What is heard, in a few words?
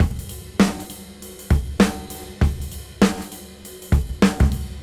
Swing 98 BPM; AMG; Keith LeBlanc Phat Butt Jams